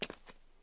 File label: footstep; gmtk24